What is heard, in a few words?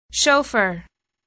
shofar